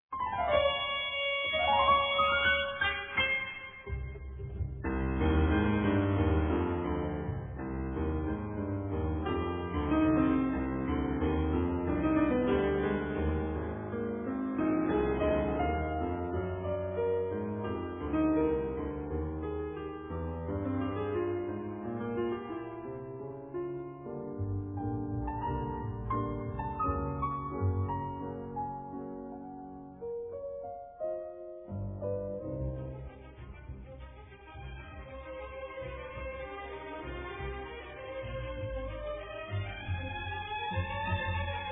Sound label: Concerto for Piano Trumpet and Orchestra; DMITRI SHOSTAKOVICH; Op 35